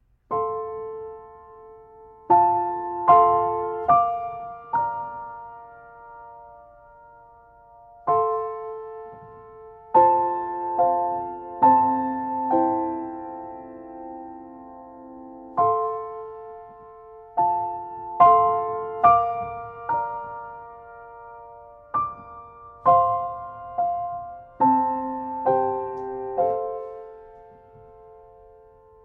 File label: Jesus Loves Me (Instrumental Version)